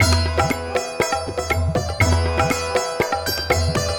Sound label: World (Sitar Versus Sitar), ringtones; Google; NewWave Labs